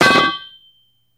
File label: Pipe Drop No Bounce